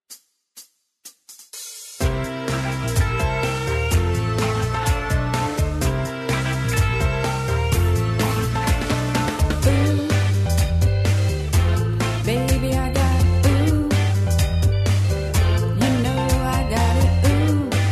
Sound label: harmony vocal, square dance music